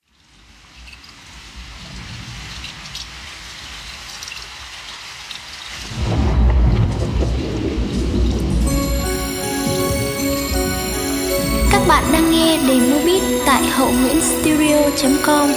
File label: beat, playback